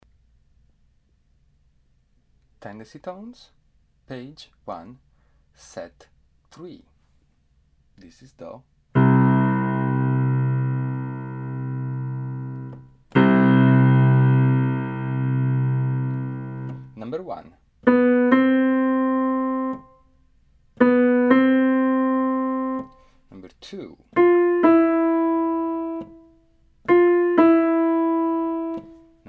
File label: tendency tones